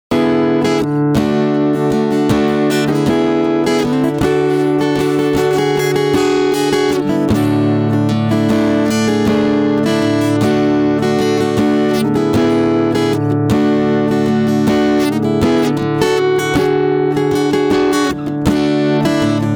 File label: Compressor Volume